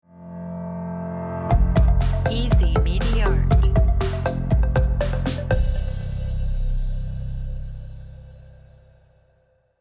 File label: corporate work logo